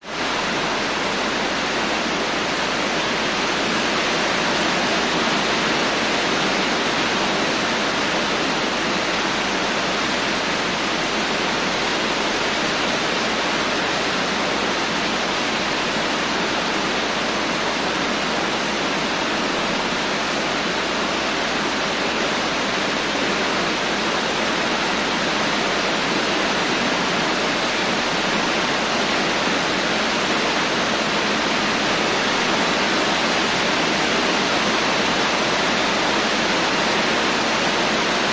nature rain heavy loud roof house internal windows closed; zapsplat